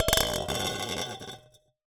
Ricochet, vase

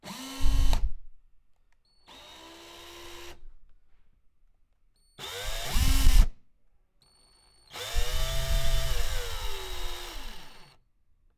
Electric Drill, Workshops

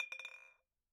Bottle Struck

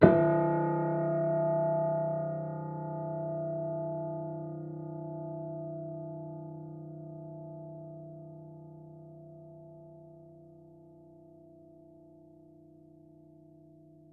Piano Harmonics, Harm Low Mid

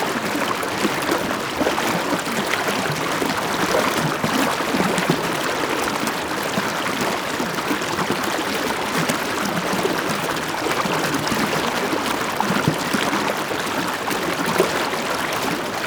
River, Static Microphone

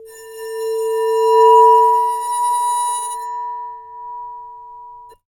Bowed, Large Wine Glass

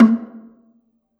Gambang Soft Beater, Gamelan

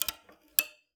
Classical Guitar Extended Technique

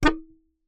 Melodica Short Note

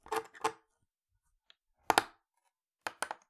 Palette, WoodenPalette

